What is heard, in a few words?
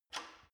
gym bicycle saddle unlock soft; sport freizeit; fitnessstudio